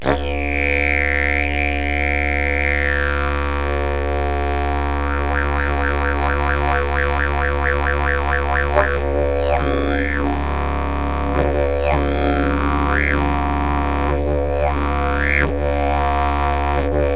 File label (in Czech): jilm, didgeridoo